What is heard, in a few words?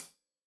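hat closed, hi-hat cymbals stereo; Deeply Sampled Drums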